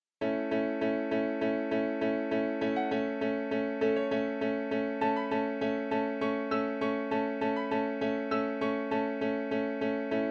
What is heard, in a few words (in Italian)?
Contraddanza Pianoforte; Abbozzi; Kafka; Ludwig van Beethoven